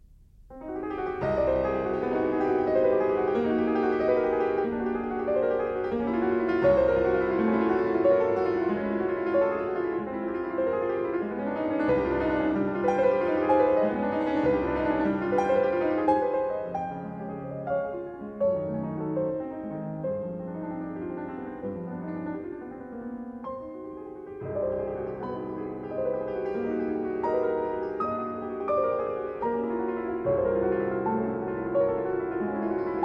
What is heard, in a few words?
Prelude in C minor, piano; 24 Preludes